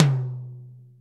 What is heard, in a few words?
hi tom one shot b key